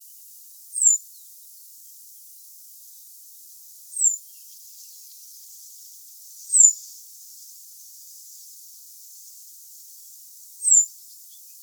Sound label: call, cirl bunting, Emberiza cirlus